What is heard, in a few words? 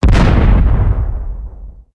Direct Hit Explosion, weapon; lancer weapon fx